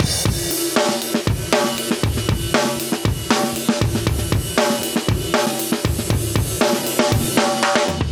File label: 118 live breaks